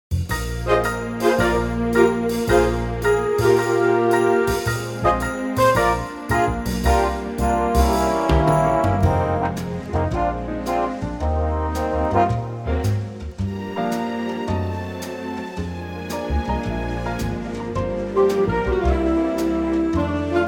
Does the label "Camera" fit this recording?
No